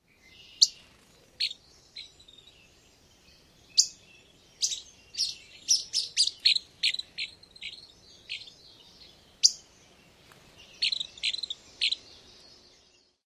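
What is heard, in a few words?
Least Flycatcher, interaction calls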